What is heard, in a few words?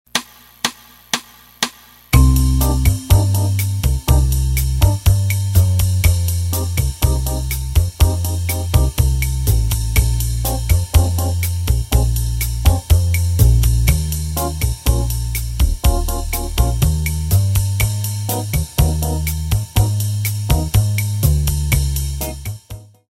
cycle minor 120, tap